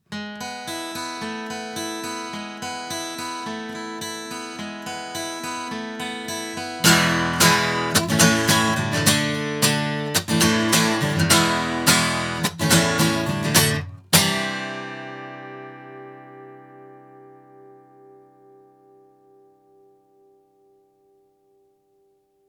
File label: TLC90 guitar, microphones recordings; Pearl